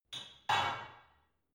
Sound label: barbell only replace unsteady reverby, fitnessstudio; sport freizeit